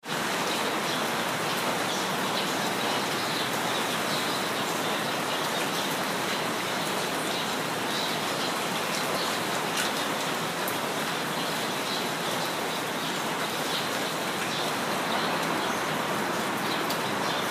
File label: rain bird